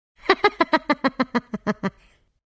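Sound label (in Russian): ведьма злая но хорошенькая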